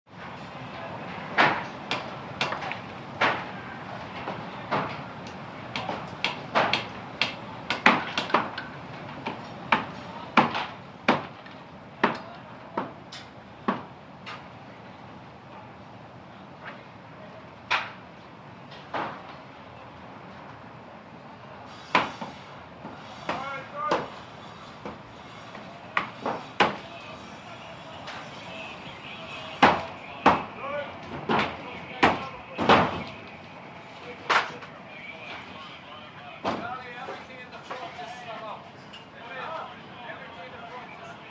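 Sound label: City Construction Background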